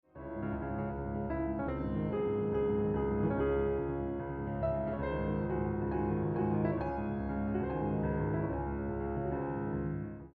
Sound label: Soothing